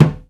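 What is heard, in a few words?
muted hand Timbales; E-MU Producer Series Vol. 2 – More Studio Essentials; Composer; Timbales Xswitch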